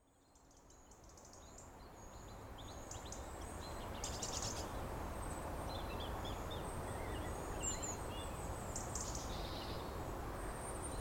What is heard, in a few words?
Forest Soundscape